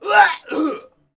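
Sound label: Settler Male Die Vox; Civ4 warlords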